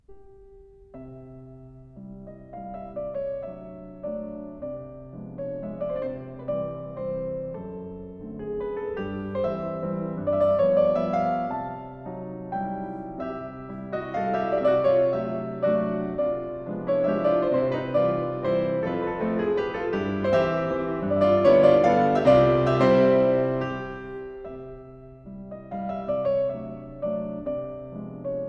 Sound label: Mazurkas, Piano; 4 Mazurkas, Op. 67; The Piano Works, Disc 10